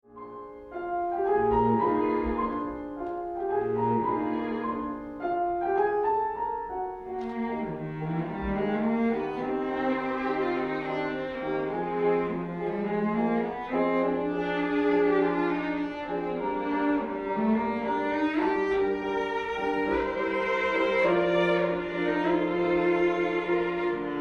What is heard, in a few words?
Piano Quartet in E Flat Allegro; Schumann